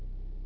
weapon hover

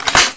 bolt back, weapons; thomp; nzp

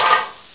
met hit; ParoxysmII; ambience

